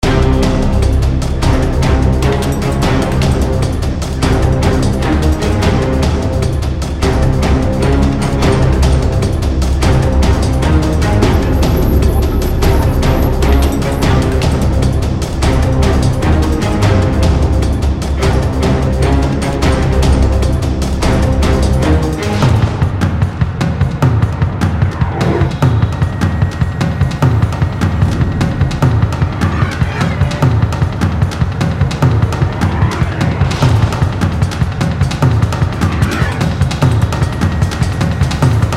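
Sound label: Music Courtyard Combat Loop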